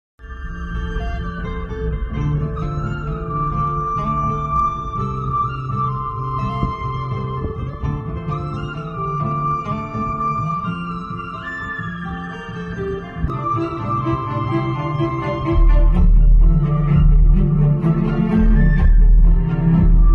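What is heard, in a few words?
sad background music; polimera 2; satyam rajesh; kamakshi bhaskarla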